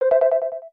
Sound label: Check Off; aronwk collection; ndaudio